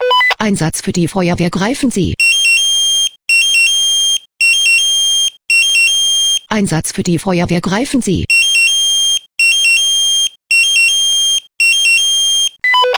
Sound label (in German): SMS Alarmierungstone; FW Greifensee